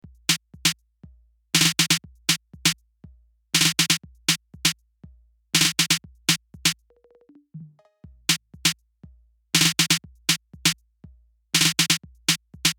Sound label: 808 Groove